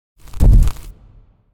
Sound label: Footstep From Monster Dinosaur With Debris; Hollywood Edge; sound designer's toolkit v2